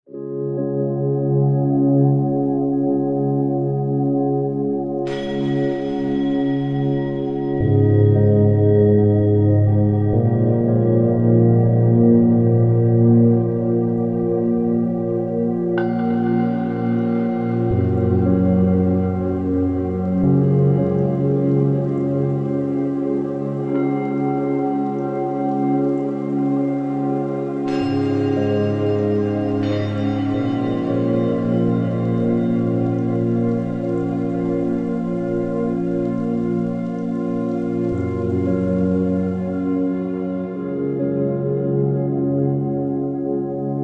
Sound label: 741hz frequency ambient music meditation calming zen spiritual music; 741 Hz - Intuition, Resolve